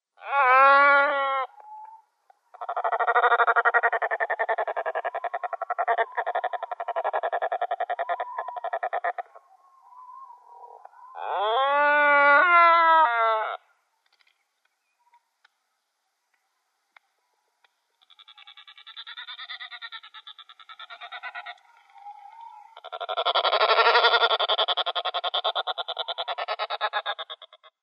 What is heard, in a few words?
Black-browed Albatross